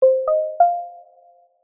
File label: multimedia alert prompt feedback tone simple sine chime 2 tone